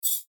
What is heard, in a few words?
chime beep button; Multimedia; Chimes; Blastwave